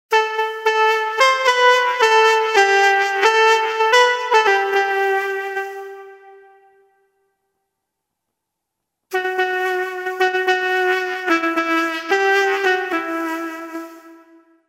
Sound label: artificial wind solo